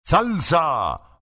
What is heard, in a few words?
salsa